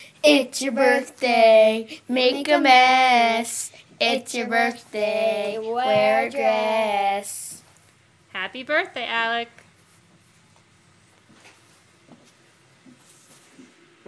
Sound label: birthday song